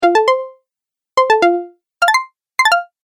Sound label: Approved App Sounds, beep